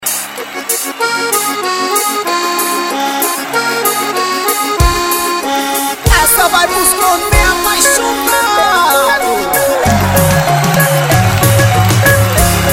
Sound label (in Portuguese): trilhas do forro